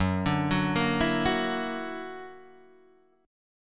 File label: Gb Augmented 7th Gb down Guitar Open D